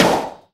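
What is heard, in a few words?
snare drum; Best Service Gigapack 2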